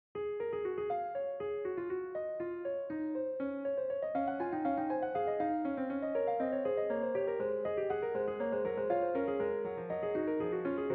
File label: California Keys